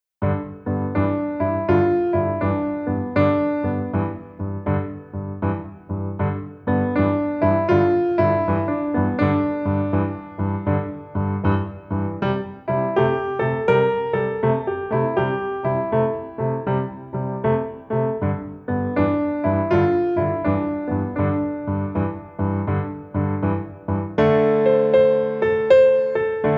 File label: Groovie Blues